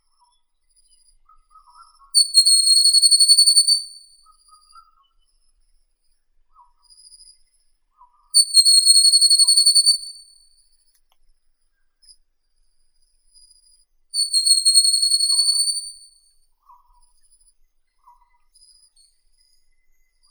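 whistling nightingale Luscinia sibilans, nature sounds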